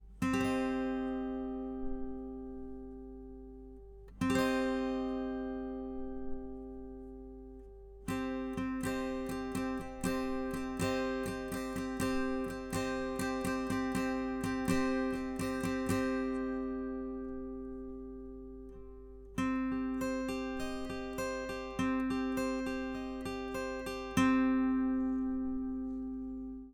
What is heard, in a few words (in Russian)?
Akkord Cm 3 lad